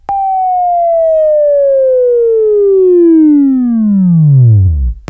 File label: Buzz; Labview-Program; Sweeping Training program